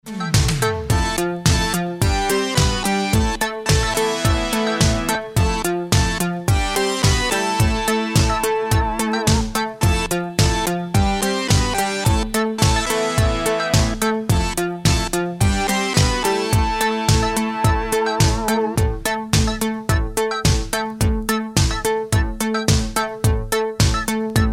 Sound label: Magic Carillon